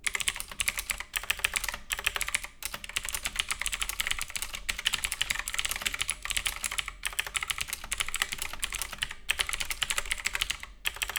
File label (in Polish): zestaw soundtest